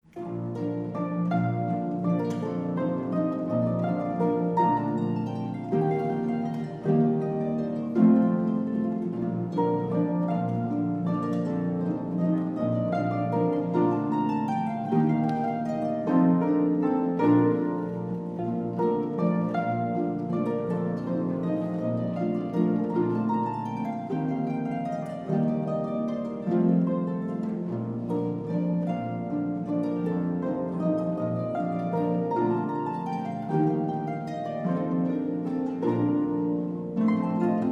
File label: Symphony Orchestras Divertimentos Waltz